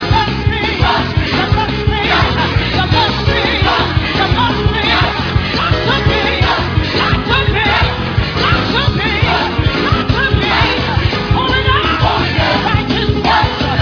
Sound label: keys